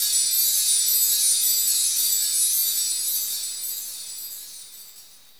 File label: wind